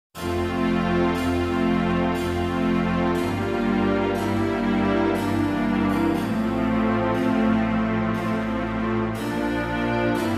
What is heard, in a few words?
ombra mai fu strings f-dur